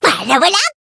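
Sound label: makiwara attack, Japanese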